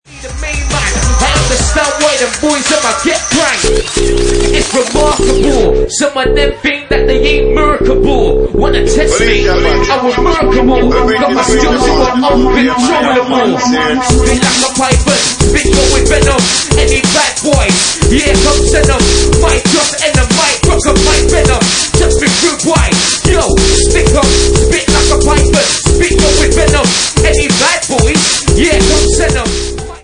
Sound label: DNB